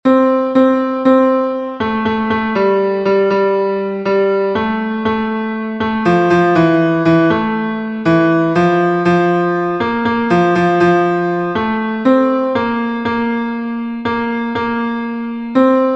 tenor, himnos en piano; GRACIAS; cantos del camino

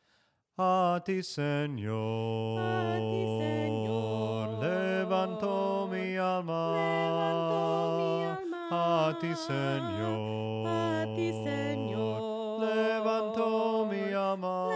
SOPRANO CANTADO, himnos en melodia soprano

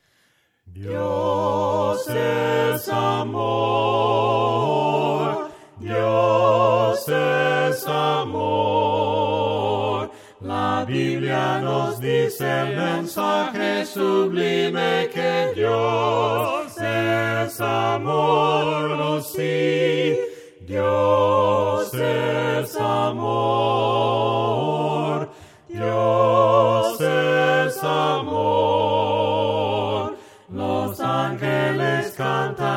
VOCES, himnos a capella; cantos del camino; DIOS ES AMOR

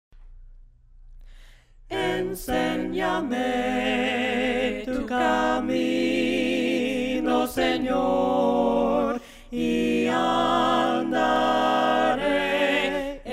VOCES

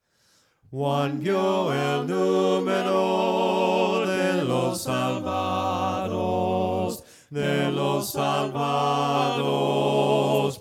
JUAN VIO EL NUMERO VOCES, himnos a capella; cantos del camino

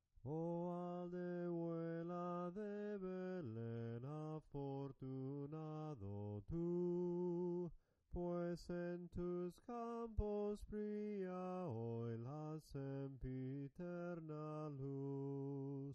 SOPRANO CANTADO, himnos en melodia soprano

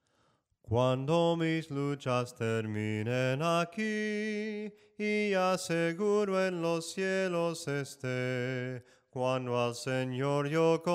CUANDO MIS LUCHAS TERMINEN SOPRANO CANTADO, himnos en melodia soprano; cantos del camino

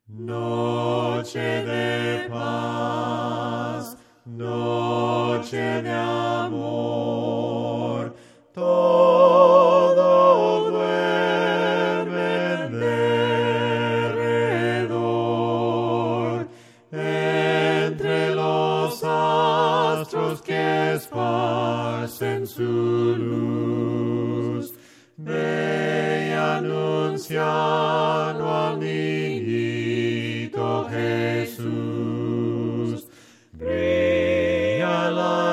VOCES, himnos a capella; cantos del camino; NOCHE DE PAZ NOCHE DE AMOR

